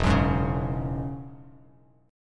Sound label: E 6th Add 9th E down Bass Standard; E6add9 strummed; JGuitar